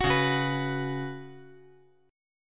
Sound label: C sharp 7th Suspended 4th C sharp